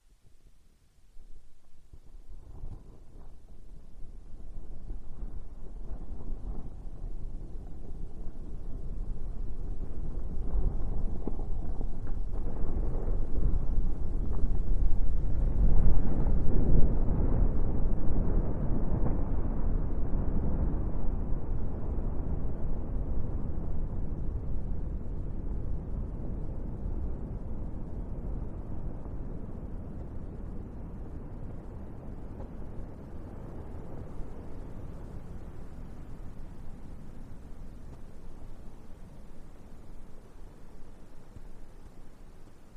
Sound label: Prominent Snow Avalanche; alaska acoustic highlights; GEOPHONY